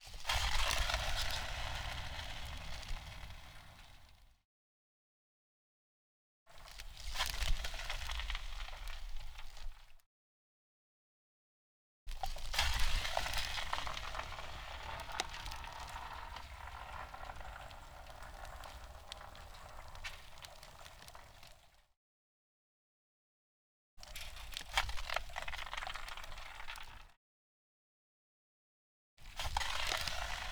Ice, HIT, Frozen Lake Surface, Throw Ice Shavings, Scatter, Underwater; The Frozen Lake